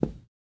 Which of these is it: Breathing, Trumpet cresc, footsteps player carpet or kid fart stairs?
footsteps player carpet